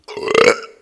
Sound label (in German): Rülpser